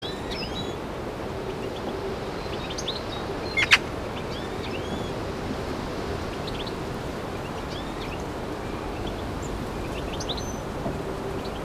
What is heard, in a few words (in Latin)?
Pica pica; SETE